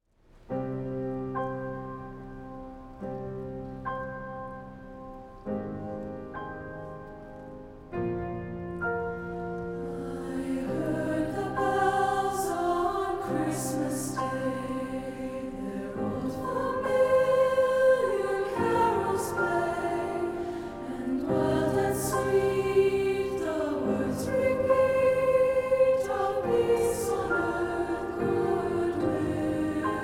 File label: vocals eng